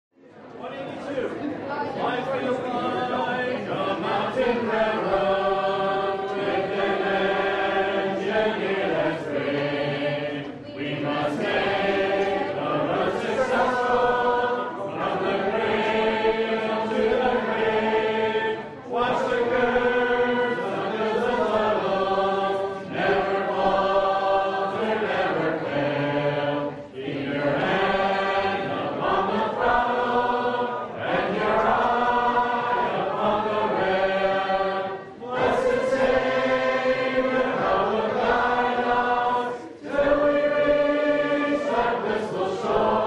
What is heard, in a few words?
Life is like a Mountain Railroad hymn, Hymn Sing; 2015Conference